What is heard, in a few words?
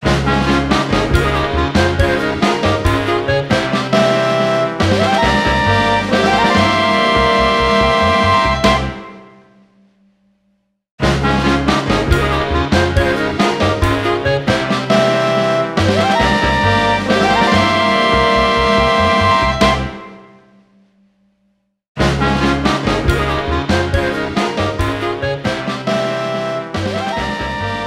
Swamp Complete; The Princess and the Frog; wii windows; Frog Music